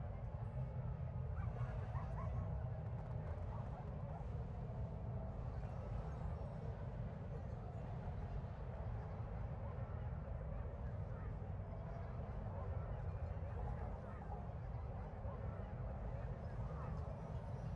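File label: market ambience muted; tft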